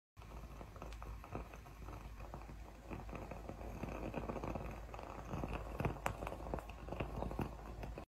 Burning wooden wick; سوختن فیتیله چوبی

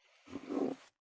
Bookshelf

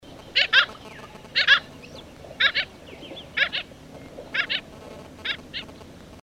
Black skimmer; پرنده آب شکاف سیاه

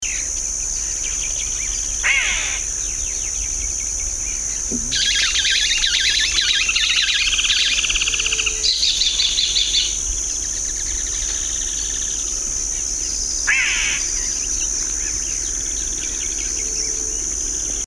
Solitary cacique

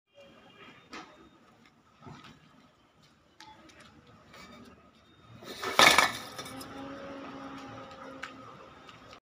brick

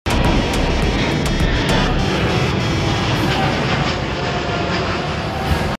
Boeing-787; بوئینگ ۷۸۷

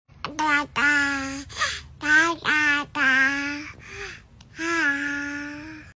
Baby without words; صدای کودک بدون گفتن کلمه ای

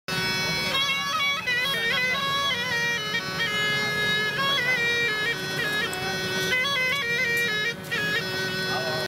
snake flute

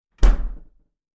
Fridge door closing hard

